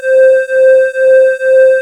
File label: TX C4, SYNTH; AKAI S-Series CD-ROM Sound Library VOL-2